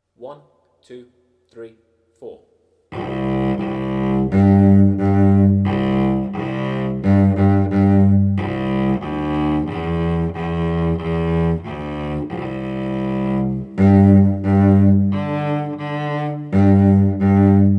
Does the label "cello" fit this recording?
Yes